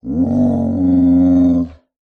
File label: Animal Mammal Carnivore Dog Doberman Growl; animals; AdobeCC